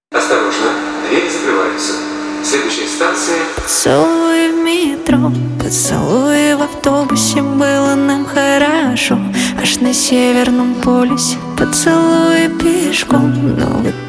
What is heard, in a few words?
acoustic